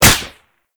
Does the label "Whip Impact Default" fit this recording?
Yes